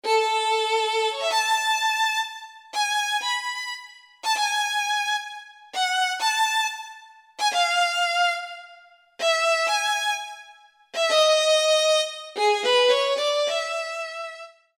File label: Virtuoso Vln, Roland SR-JV80-01 Pop